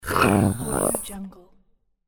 ferret

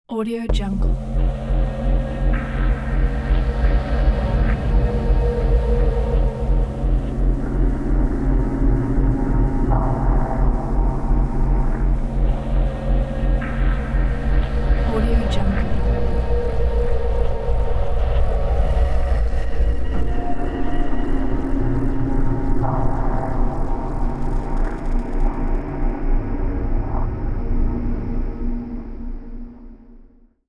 tense atmosphere

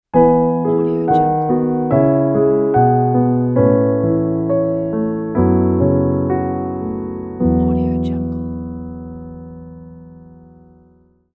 classic piano logo

